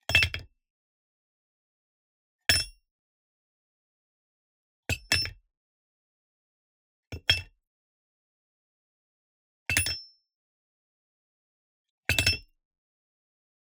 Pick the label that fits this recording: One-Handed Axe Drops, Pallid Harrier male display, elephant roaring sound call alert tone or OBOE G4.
One-Handed Axe Drops